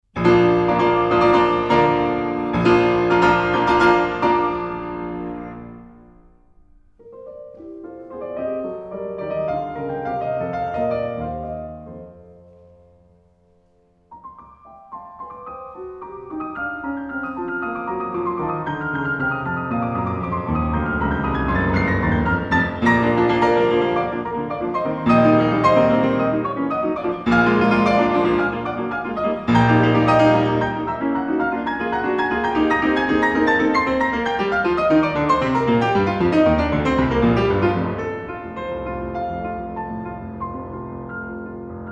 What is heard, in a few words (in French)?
piano sonate; francois frederic guy; beethoven; en public